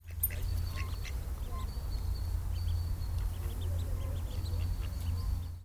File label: ducks and ducklings on the river ouse bedford